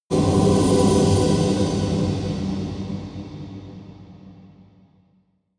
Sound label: air turbine storm